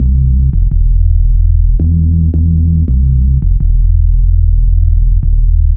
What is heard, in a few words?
Menace Throb Bass, Bass Lines 166.5; Zero-G Jungle Warfare 1, 2 & 3; 90 s Sample CDs